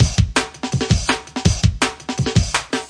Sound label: flat funk, drum loops and breaks, 165 bpm